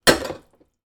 household spoon drop into washing up bowl of water and dishes